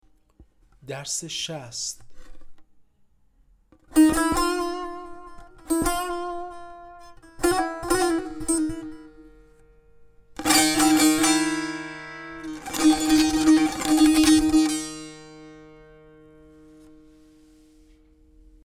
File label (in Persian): مشق تنبور